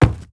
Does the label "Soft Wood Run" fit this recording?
Yes